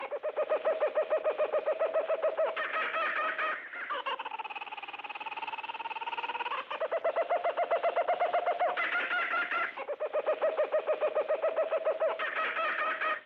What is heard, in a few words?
Kookaburra, A laughing kookaburra